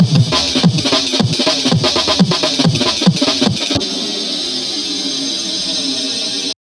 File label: Dr Loop break